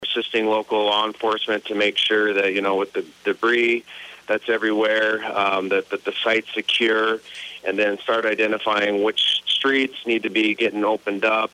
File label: dice; wessington